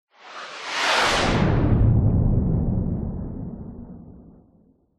vehicles aircraft fighter jet pass by fast designed